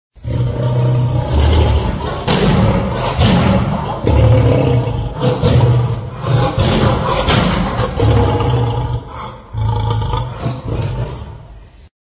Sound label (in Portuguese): sons de leao